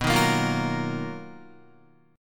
B-Major Sharp 11th B down Guitar; BM#11; strummed; JGuitar

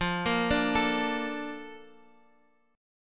Bb Major 7th, F

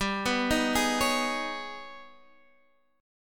Ab Minor Major 11th

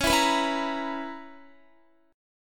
Db Minor 13th Db Guitar; Dbm13 strummed; x,16,16,16,16,18; JGuitar

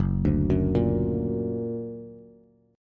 Gb Augmented Bass; Gb+ arpeggiated

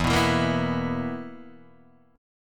B Major 7th Suspended 4th E

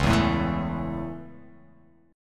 C sharp Minor Sharp 5th, C#m#5 chord; C#m#5 strummed; JGuitar

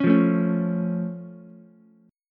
A Augmented A, Ukulele; strummed; JGuitar

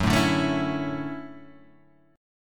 D-Major 9th F sharp

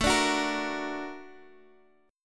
Bb Augmented Major 7th A

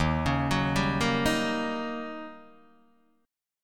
D sharp 7th Sharp 9th, Guitar, Open D

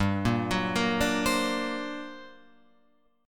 G Minor Major 11th, Guitar, Open F; GmM11 arpeggiated; JGuitar

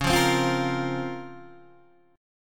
C sharp Major 13th C sharp, Guitar Open D; down; chord shape

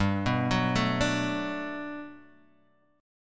G Major 9th, G, down, Guitar, DADGAD

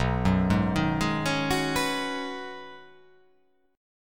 B 6th Add 9th B, chord

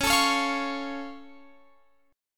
C sharp Augmented down Guitar Open F, chord; strummed; JGuitar

